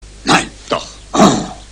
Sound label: ooh; cstrike